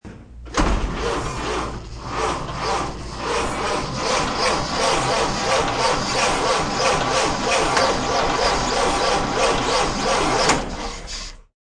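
Model T Ignition, 4 Cylinder having problems turning over; 1931 Ford - 4 Cylinder Does Not Turn Over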